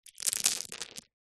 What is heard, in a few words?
foley pebbles many small drop low height soft surface